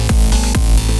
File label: ahaha